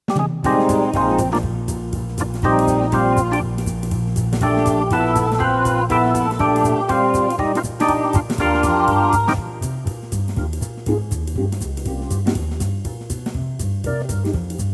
live organ

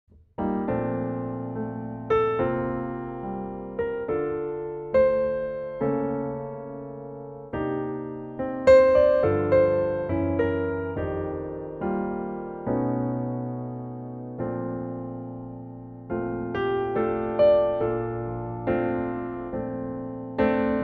Bb Piano

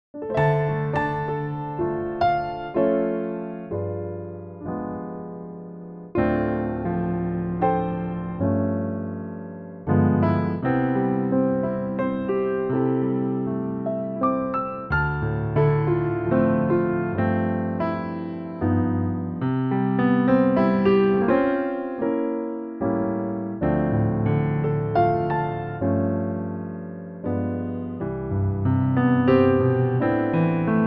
C Piano; key - C - vocal range - A to D

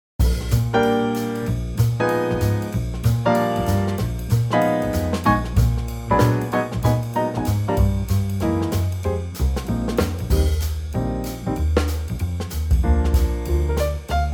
Eb trio; key - Eb (bridge Gb, chorus in Bb, key change to B) - vocal range - Bb to Eb (optional E)

